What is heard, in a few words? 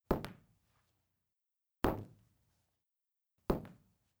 Foot Steps; Welcome to the Game